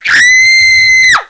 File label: Female Scream; Joker 1996-03 & 04; RIPPER